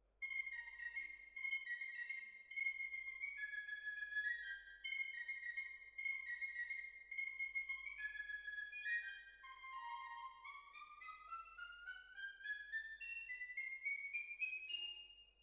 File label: Hall